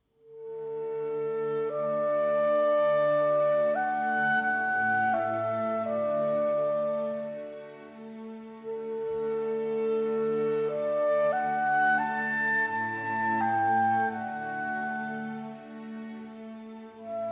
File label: clarinet concerto in a major adagio